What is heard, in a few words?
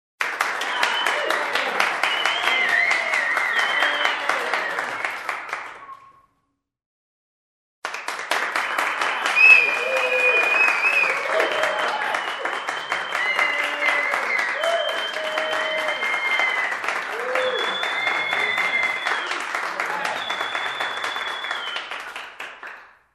Applause from a small crowd indoors; standing ovation